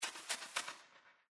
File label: footstep penguin